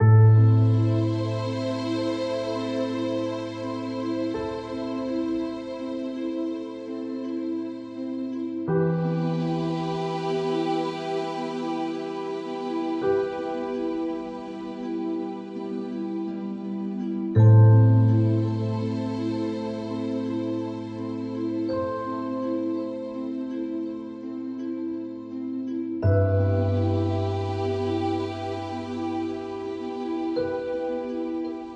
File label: Melancholy Ambience; gamerip; Heavenworld